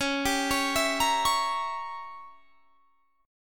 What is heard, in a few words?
Db Diminished 7th Db down; arpeggiated